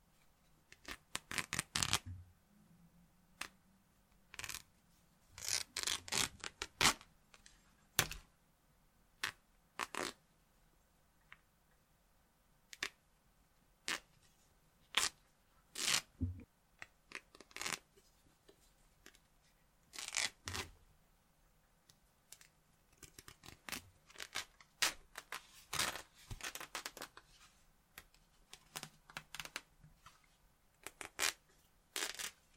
razriv tkani

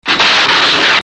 shum zapuska reaktivnogo snaryada

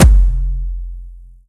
bolshoj tom

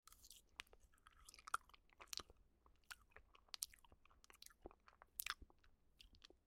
sosanie

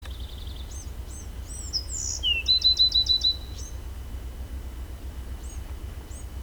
priyatnoe negromkoe penie vyurka sredi raznoobraziya ptic

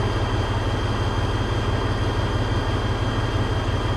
nadoedlivij neprerivnij shum za bortom samoleta

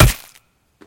bojcovskij boleznennij udar

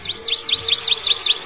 penie taui s harakternim svistom

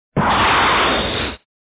zapusk raketnih zvukov ot samoletov

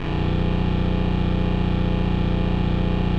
mehanicheskij gul kak u dvigatelya motora ili chego to eshe

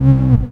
knopka cifrovoi snizhodyashii spokoinii

